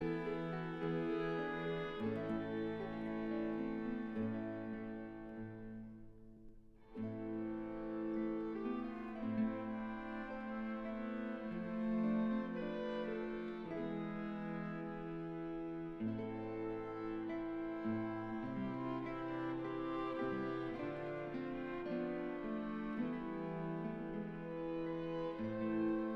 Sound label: Quartet